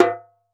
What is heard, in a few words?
Dholla Tak; Roland BK-5; Percussion; Dholak